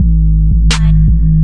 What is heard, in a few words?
83 bpm, lowrider wobba wobba 2step, drum loops and breaks